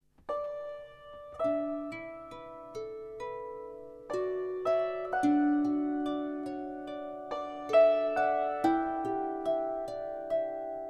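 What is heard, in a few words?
Lullaby, Harfe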